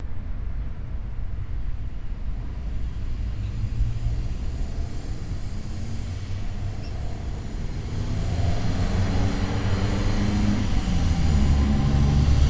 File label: Elect Bus Away, electric bus